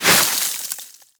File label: Sword Elemental Ice Whoosh; Enemies; Weapons; Warframe; Teshin